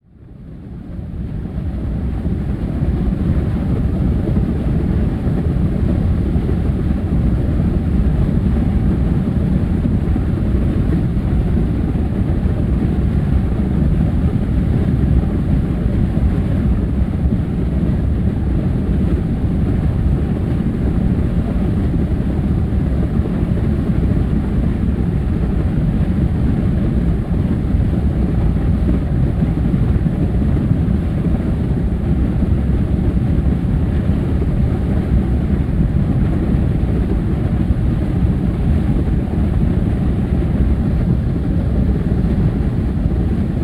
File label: water myvatn